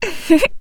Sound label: laugh; Eternal Return; Tia; ENG